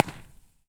Fortaleza De San Carlos De La Cabana, Impulse Response; EchoThief Impulse Response Library